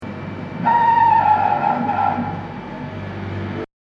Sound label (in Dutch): Ijskast